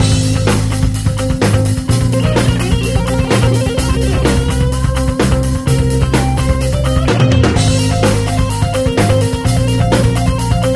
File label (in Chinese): 普通战斗背景音乐